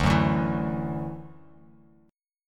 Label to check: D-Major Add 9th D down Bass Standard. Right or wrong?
Right